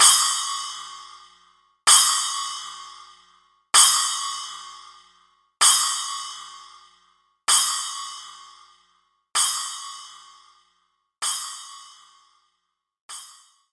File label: Splash Cymbal